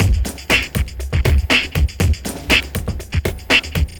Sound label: housephunk, beats; Sonic Foundry (Sony Creative Software); Ugly Remnants VOL-2